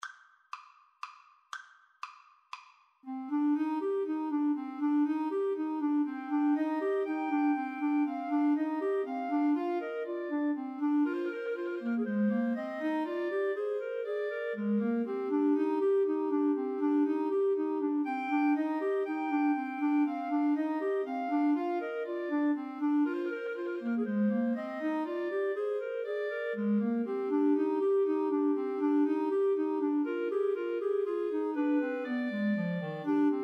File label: Clarinet trio